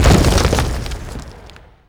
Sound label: dust bits; WAR